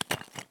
walk tile; Escape from Tarkov

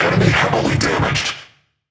Hit Confirmation; World Of Warships

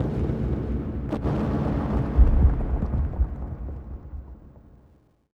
city occasional

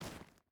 footstep hide stones walk; Rust

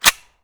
mr153 slider down; Escape from Tarkov

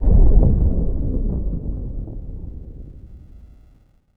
harbor occasional

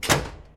Aircrafts P-51D Door Close; DCS

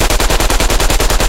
loop maxim; guns tanks; War Thunder

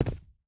footstep boots concrete jump land; Rust

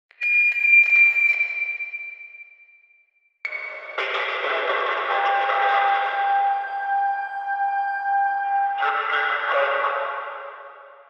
ambient port; World Of Warships; clanbase

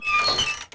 metal creak

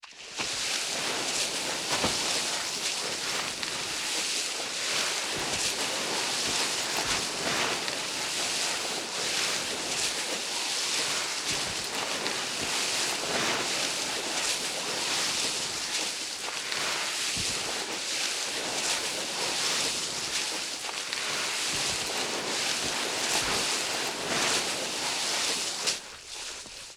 drag from car; Modern Warfare

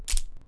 Crab Move; Civ4 warlords; Resouces and Improvements

